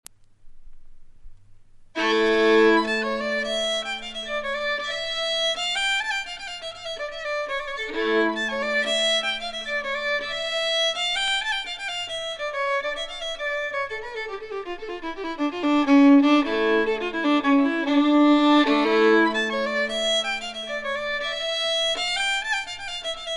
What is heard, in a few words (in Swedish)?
polska; Lapp-Nilspolska